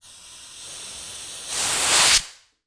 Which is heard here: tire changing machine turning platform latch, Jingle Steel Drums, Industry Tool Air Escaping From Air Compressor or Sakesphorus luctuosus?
Industry Tool Air Escaping From Air Compressor